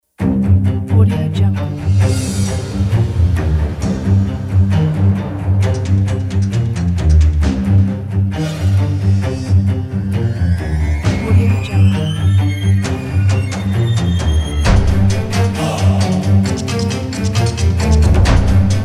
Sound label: epic cinema dance of death